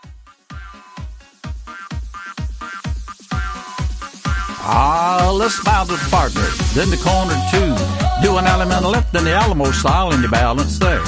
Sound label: Patter